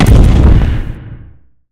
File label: Explosion Sound; Turkey Cooking Simulator; Kitchen